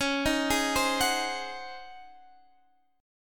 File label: C sharp Major 7th Suspended 2nd Suspended 4th C sharp, Guitar DADGAD; arpeggiated